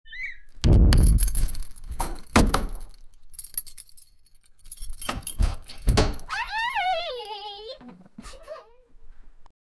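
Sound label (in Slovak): odomknutie dverí, door unlock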